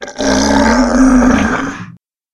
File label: monster ettin